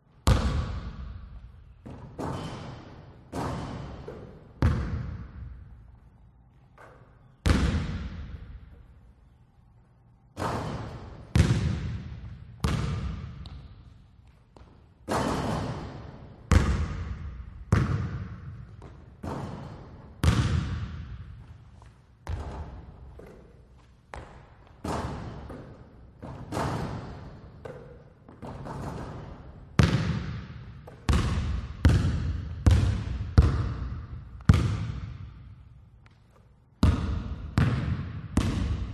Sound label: basket ball player solo bounce and shoot empty gymnasium school hall quiet